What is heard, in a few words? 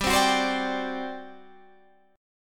G Major 7th Suspended 4th, G, down, Guitar, Drop D